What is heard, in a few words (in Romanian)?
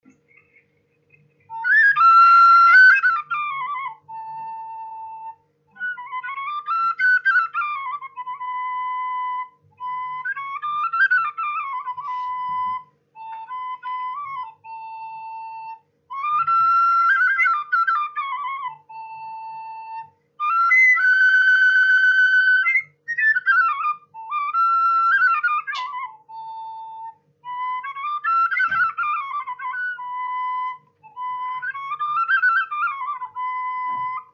Doina fluier; Vaslui